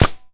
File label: Gun Paintball Shot; SFX Kit; Weapons